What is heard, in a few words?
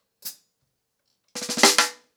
Snare 110BPM, ACOUSTIC DRUMS; Sonokinetic - The Roots Drumloops